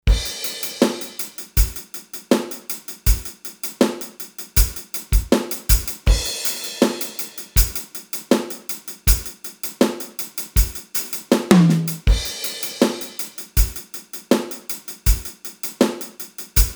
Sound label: Pop